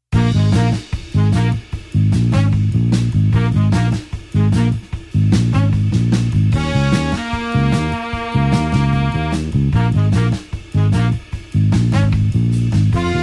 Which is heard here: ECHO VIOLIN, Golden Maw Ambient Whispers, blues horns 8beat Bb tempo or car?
blues horns 8beat Bb tempo